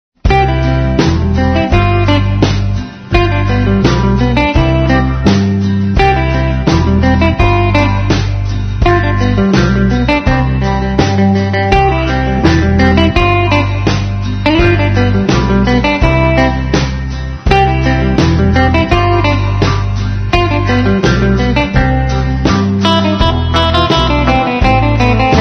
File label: Gtr Toasted Danish; A Kay Value Leader branded Old Kaftsman much like the guitar Lonnie Johnson used in his fi